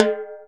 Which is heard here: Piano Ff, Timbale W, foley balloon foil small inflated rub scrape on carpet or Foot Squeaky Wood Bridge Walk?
Timbale W